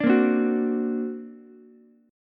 D Augmented 7th Ukulele, chord; D+7 strummed; JGuitar

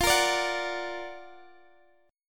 Gb Augmented Major 7th F

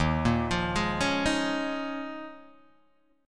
D sharp 9th Suspended 4th D sharp, Guitar Open G

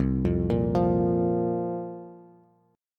C 7th Suspended 4th, Bass; C7sus4 arpeggiated

